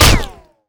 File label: Gun Shell, Damage